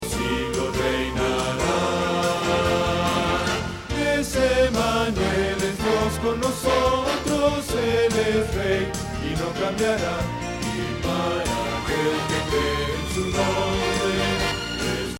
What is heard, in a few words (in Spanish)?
Bajo